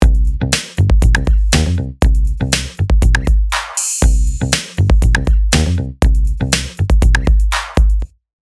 Bass Dry, Listen to how the bass rides on top of the rhythm without reverb.; 3d mixing